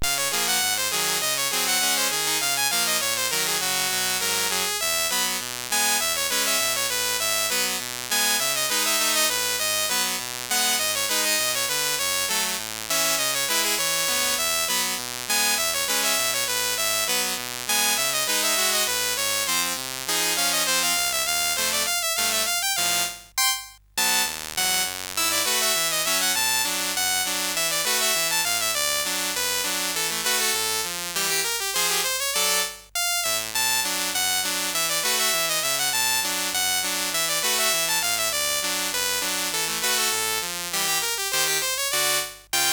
Music Box, CSG8580R5; Eight C64 Games in Six Minutes

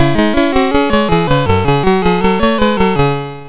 MOS6581R2, sid; Rupert and the Ice Castle

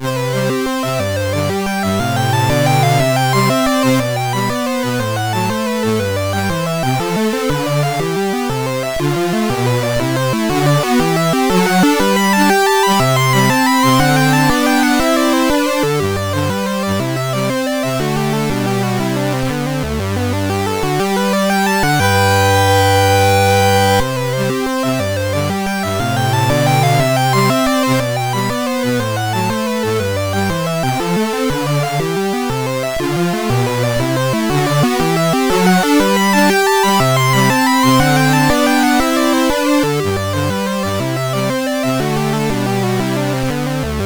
Gigue, CSG8580R5

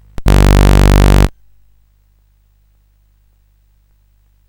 sid CSG8580R5; California Games

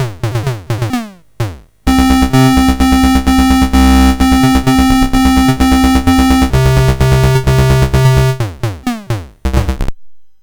Music MOS6581R4; Suicidal Software

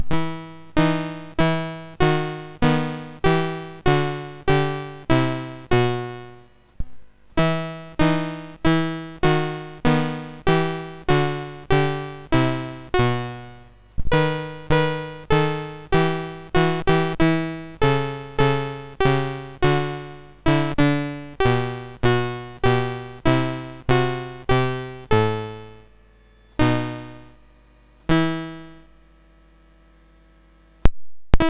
Menuet BASIC, MOS6581R3; Menuet Visee

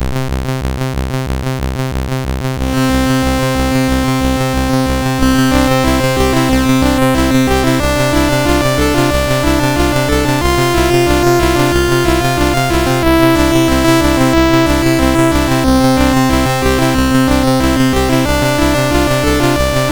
sid, MOS6581R2; FlaschBier

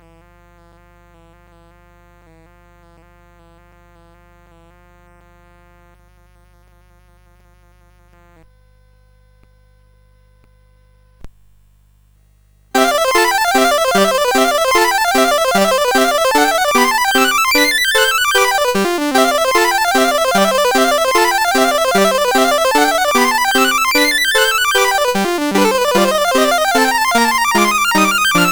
Demonic Study in C Major, CSG8580R5

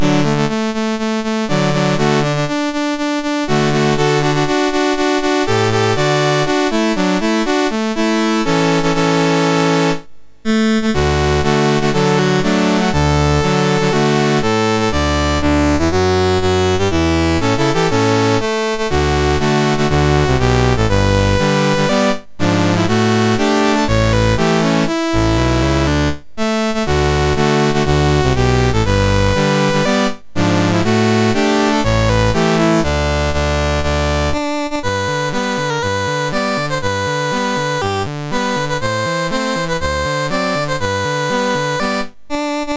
MOS6581R3, National Anthems; Panama

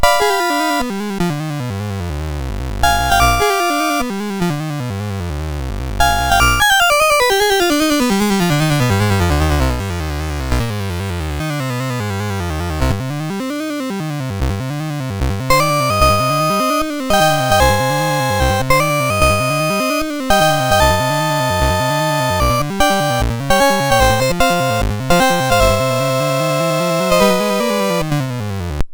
Etude in C Minor, MOS6581R2

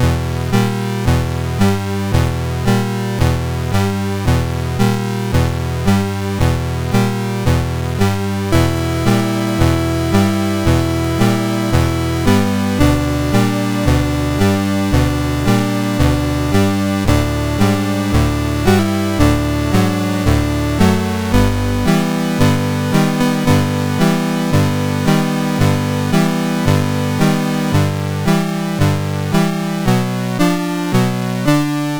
Prelude in A Minor, MOS6581R2; Op28 No2

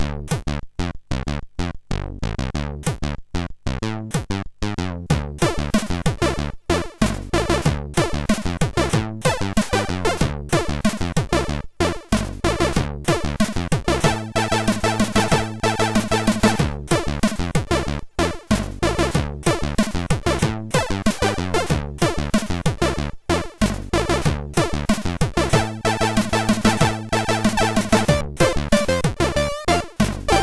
MOS6581R3, sid; Garfield

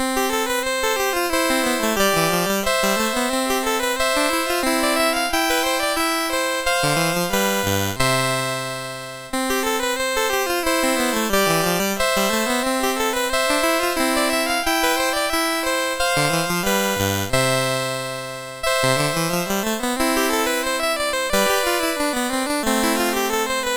MOS6581R4

